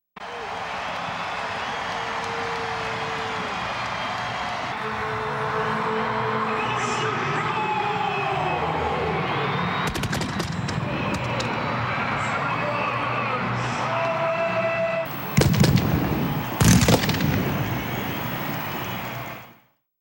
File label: crowd cheering